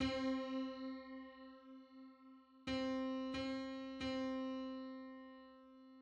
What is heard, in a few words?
Pythagorean comma on C